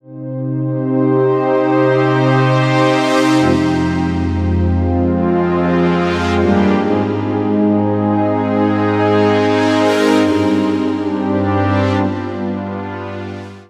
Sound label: Modulation Pad; Pads; Dr. Fink's Funk Factory